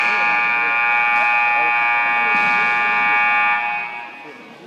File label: Scoreboard Buzzer